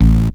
D.B. Bass One Shot (A)

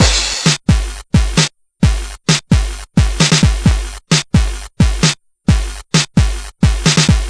Game Boy Progressive Rock Loop, Drums

